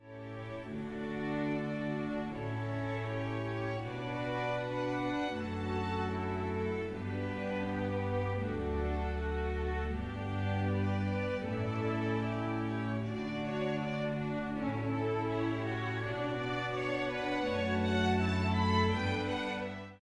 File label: canon; pachebal